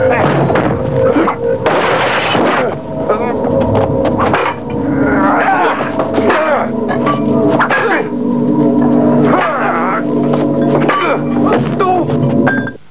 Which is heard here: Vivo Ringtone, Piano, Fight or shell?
Fight